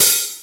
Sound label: Hat, E-MU Proteus-2000